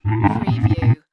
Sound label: Alien Vocalization; sound effects bible